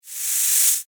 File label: Animal Snake Hiss; Snakes